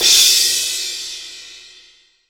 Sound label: JAZY CY, Crash Cymbal, MONO CRASH; AKAI S6000 CD-ROM - Volume 3